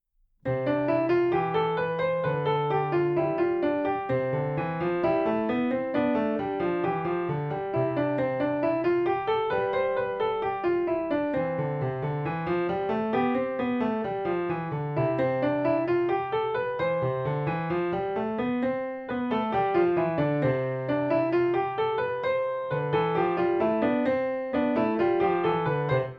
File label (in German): Tonleiter Spaß